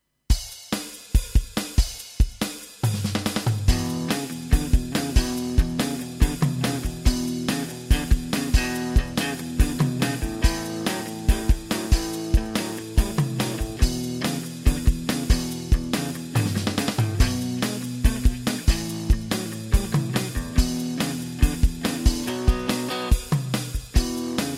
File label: rock and roll rytm